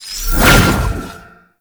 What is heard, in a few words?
Rune Cast Burst; Warframe; Uriel; Vythelas